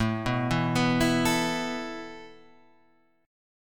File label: A Suspended 2nd, guitar chord; Asus2 arpeggiated